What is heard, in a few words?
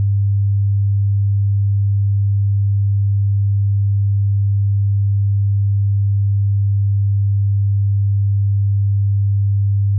Bass 100hz